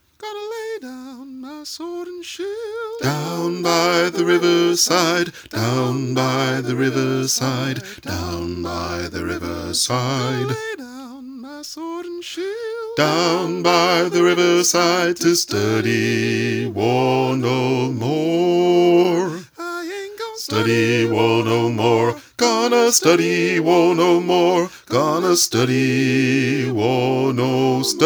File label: Bass, gospel choir; Down By The Riverside